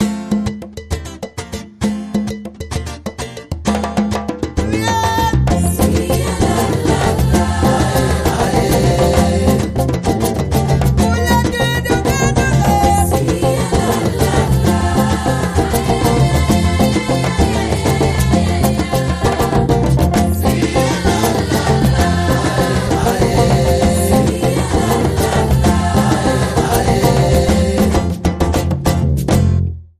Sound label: Jungle Funk